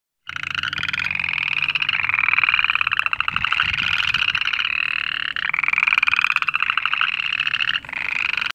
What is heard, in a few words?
Frog Tone